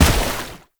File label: Heavy Step Water, Footsteps; Warframe; Tau Dax